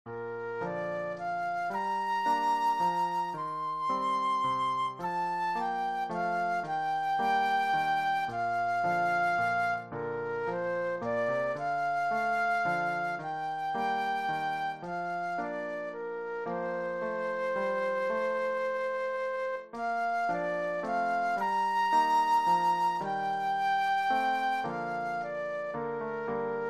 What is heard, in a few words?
Flute Solo; christian solos